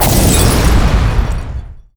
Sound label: ignite target; dota; ogre magi